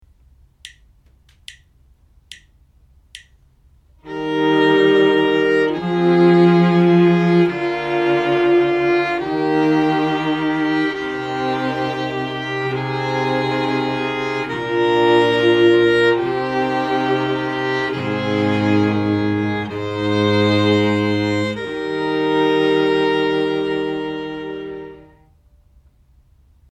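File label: harmonic dictation, string quartet; ear training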